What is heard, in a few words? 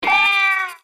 Cat Hit; Mr. Bean - Bean Skidding